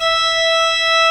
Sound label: E 5, PIPE OR; AKAI S-Series CD-ROM Sound Library VOL-2